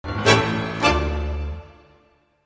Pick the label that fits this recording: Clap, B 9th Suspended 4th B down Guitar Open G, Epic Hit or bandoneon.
bandoneon